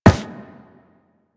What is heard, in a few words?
Run Metal, Footsteps; Warframe; Operator